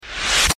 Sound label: whip, reverse